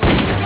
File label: close door